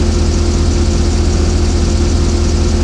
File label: engine; MAXOVER